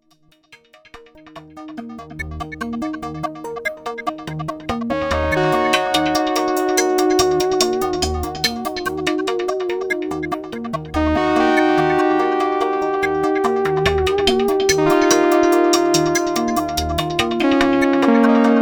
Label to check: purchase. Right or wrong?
Wrong